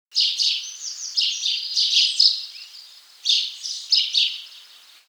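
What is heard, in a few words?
small bird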